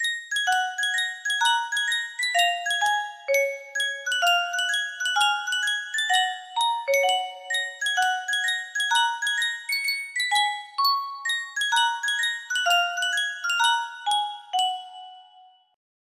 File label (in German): Yunsheng Spieluhr